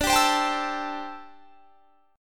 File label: D 9th Suspended 4th D down; D9sus4; strummed